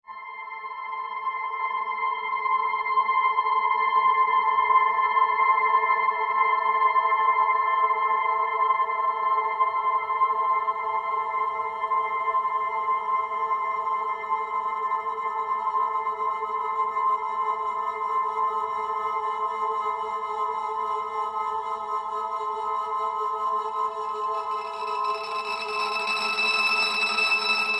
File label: sample cloud